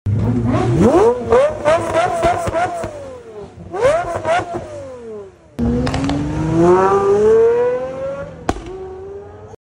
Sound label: Pagani Zonda